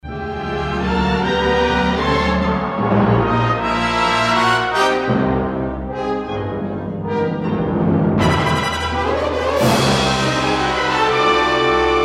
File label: Forest - Combat; Scout Walker Scramble 2